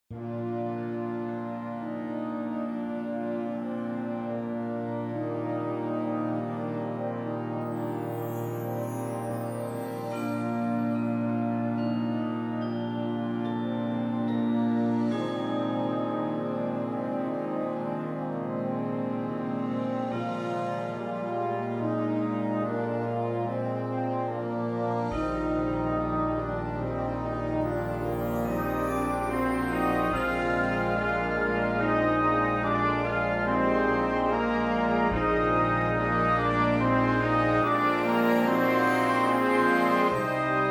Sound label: Firebird Suite